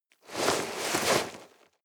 nylon leather walk

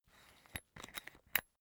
umbrella telescopic extend slow

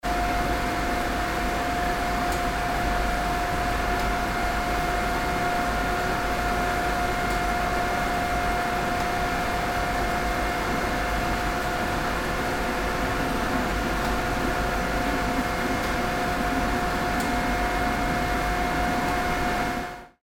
machine hall

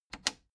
plastic button latch loose click disable fast

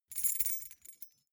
key ring small rattle air

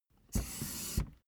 office chair hydraulics down

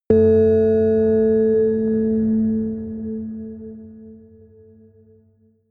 melodic odd harmonic bell low

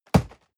box hit hard rustle

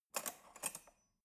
cutlery fork drawer handle soft

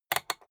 rotary control short fast turn hard

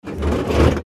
tram door closing

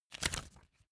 magazine flip forward fast

